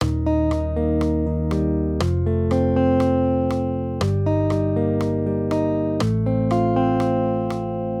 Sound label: maj triads